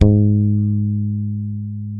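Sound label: Sadowsky 5 MUTE G#2, Bass; Sampleheads; Will Lee Bass Library; SADWSKY5MUTE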